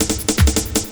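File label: loop snare drum, 160 BPM, Drum & Bass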